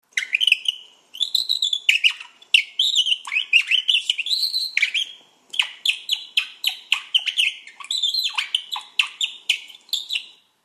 Nachtigall, Musikinstrumente

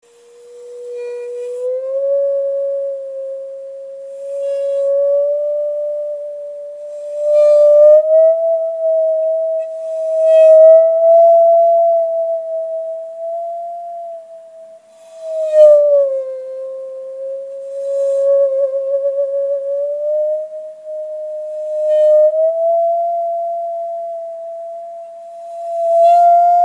Singende Sage

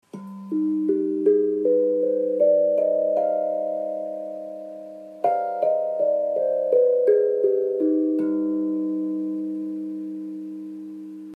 Zenko Omega Schlägel

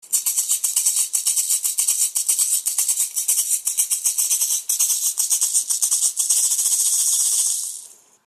Rattan Maraca

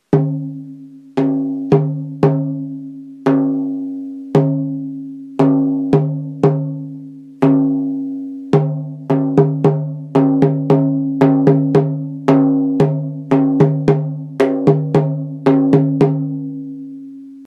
Schamanentrommel klein

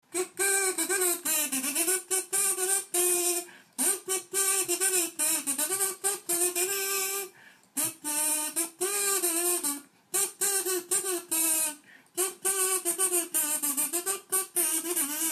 Holz-Kazoo

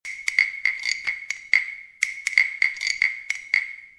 Holzvogel

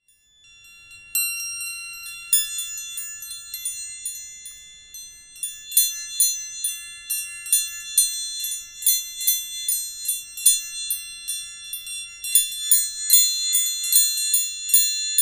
fische, Musikinstrumente

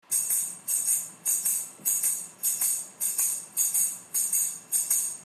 Jingle Fusspercussion

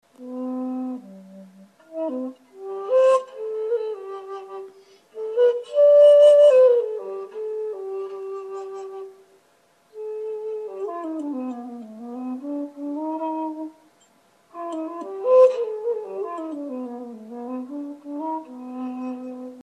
Kupfershakuhashi

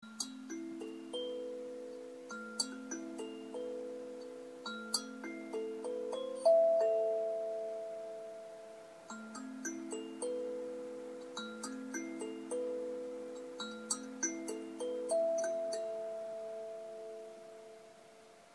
Sansula Renaissance A Moll

